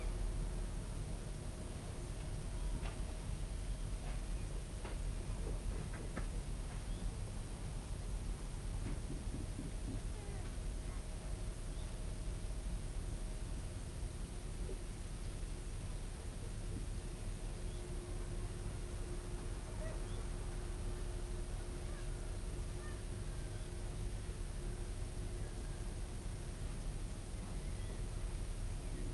ambient norway pier boats floating and pulling lines seagulls and engine in the background; sonniss gdc